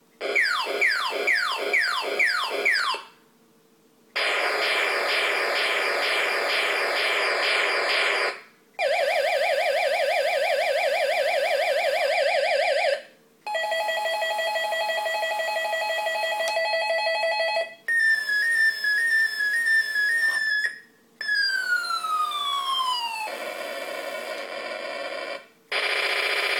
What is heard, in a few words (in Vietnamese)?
Tieng sung gia sung do choi